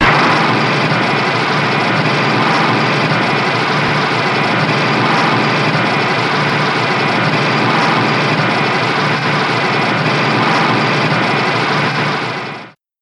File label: Dorsal Plate Beams; Shin Godzilla